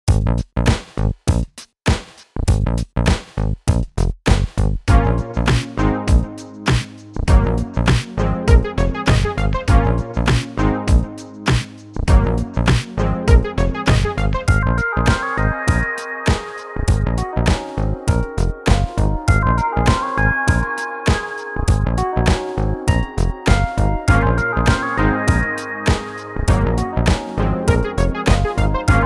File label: Future Bass